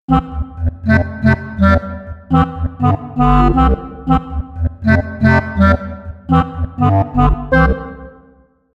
Clarinet

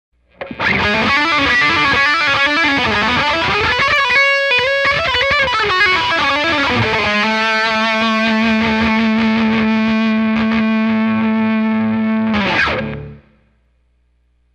Lead Boost LP Bridge tight

